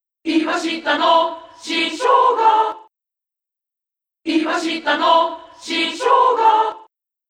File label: sound logo